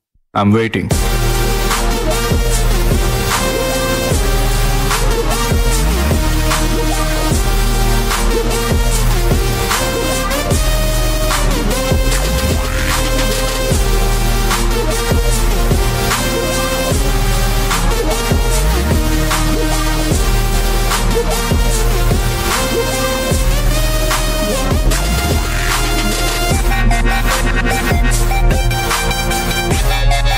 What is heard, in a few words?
bgm ringtone remix bass boosted; thuppaki